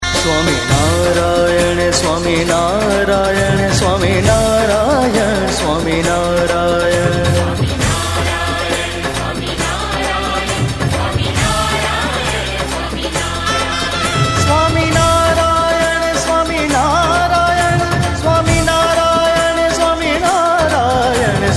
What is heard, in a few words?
SWAMINARAYAN DHUN